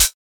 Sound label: muted hi hat one shot f sharp key, Royality free high-hat tuned to the F# note; hat samples